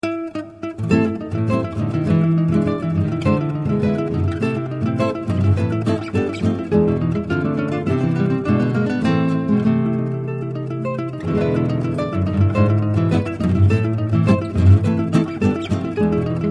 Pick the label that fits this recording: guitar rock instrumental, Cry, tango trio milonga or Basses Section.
tango trio milonga